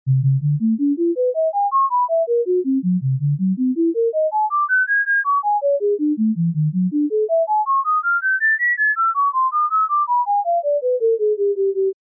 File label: Sci-Fi ringtones